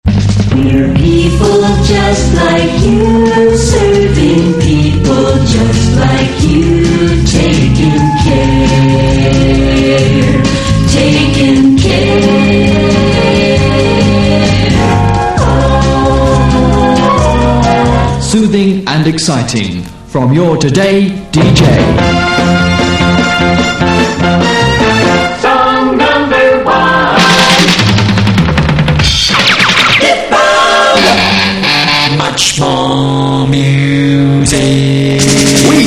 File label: jingles